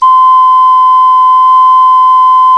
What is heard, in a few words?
CLAYFLUTE, Heart of Africa; Spectrasonics; Heart of Africa 1